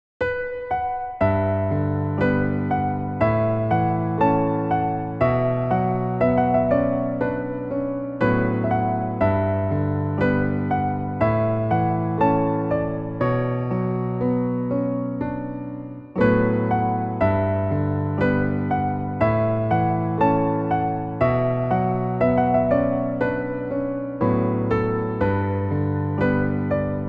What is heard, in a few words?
~ Piano Version ~